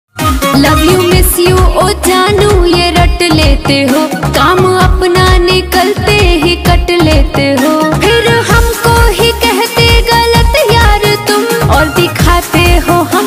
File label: bhojpuri ringtone